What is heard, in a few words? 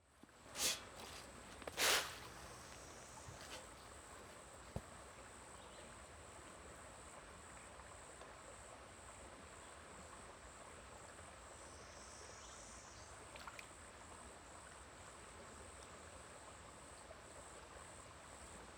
fish feeding